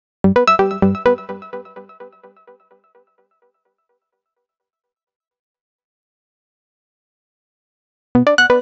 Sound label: 129 Em Arp, Complex Electro Synths; Prime Loops; Arena